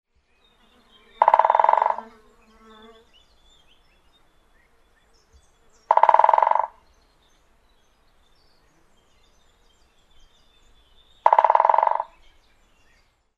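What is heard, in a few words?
great spotted woodpecker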